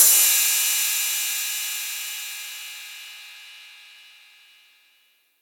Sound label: Single Cymbal Hit D Key, Royality free cymbal sound tuned to the D note. Loudest frequency: 8487Hz